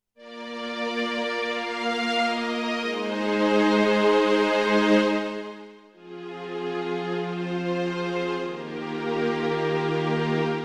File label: Roland HP702 Symphonic Strings